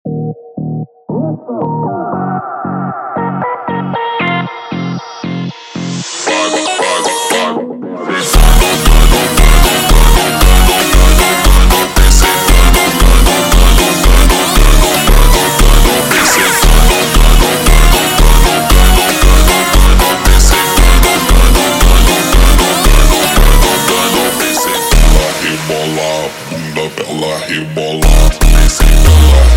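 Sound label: slowed, phonk music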